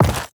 leather drop; ui; inventory

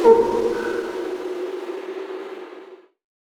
scurry cast; weapons; dota; hoodwink

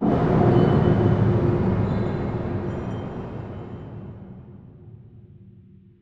shadow cast; weapons; dota; kez

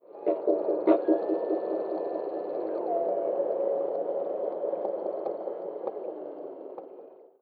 echo clicks; ambient soundscapes; dota

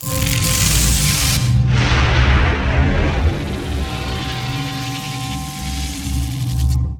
kinetic field; weapons; pinfold; dota; disruptor

